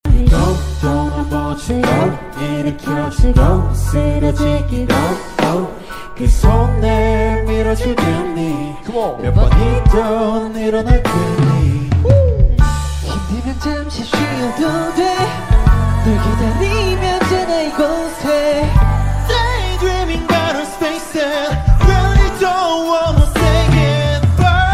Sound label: korean ringtone